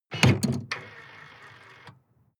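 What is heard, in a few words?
sports car exterior trunk unlock; verkehr transport; autos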